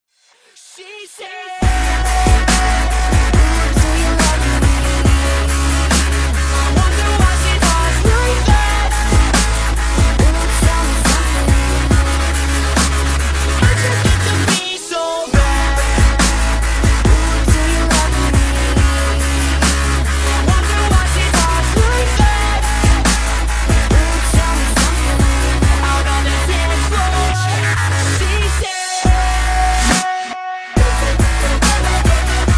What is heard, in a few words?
dubstep remix